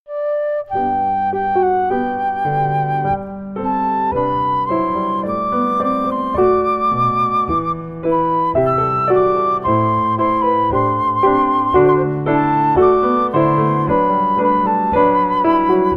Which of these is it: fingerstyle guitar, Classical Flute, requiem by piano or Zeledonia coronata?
Classical Flute